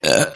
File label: burp